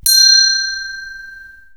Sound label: small temple bell